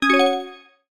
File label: Confirm